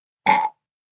Burp High PItched, Burp, 1 young child, short, high-pitch, human voice, kid; Burping